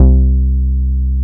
C2, BASSES

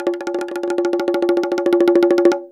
SMALL DRUM, CHINESE PERC; Spectrasonics; Heart of Asia

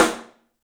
STEEL, Drums, ROOM SINGLE; 3D Sound

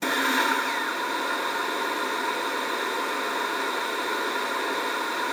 luft, alto sax; Xsample VOL-2

